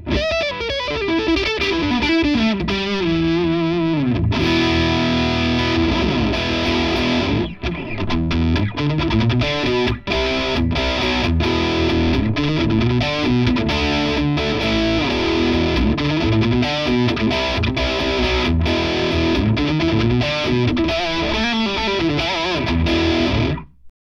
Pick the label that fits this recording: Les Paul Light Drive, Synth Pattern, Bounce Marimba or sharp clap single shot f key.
Les Paul Light Drive